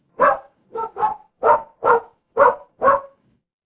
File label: animal dog bark